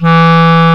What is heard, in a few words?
CLARINET E2, WIND